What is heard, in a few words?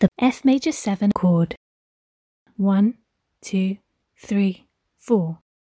f major 7 chord